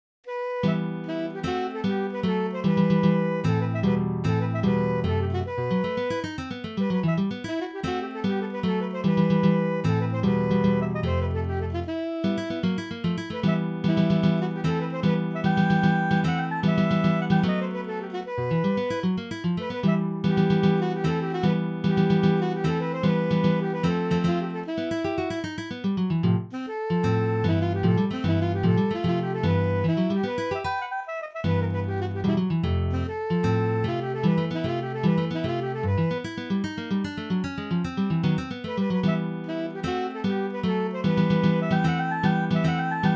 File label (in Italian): Saltarello, Il Saxofono Italiano; MIDI; Enciclopedia del Saxofono Italiano - SaxIt